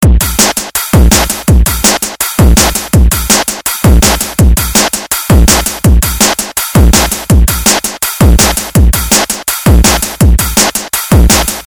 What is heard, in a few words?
Loop